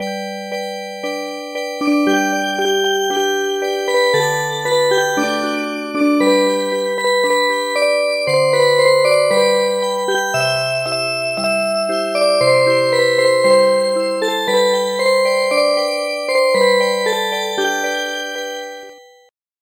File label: Music Box, traditional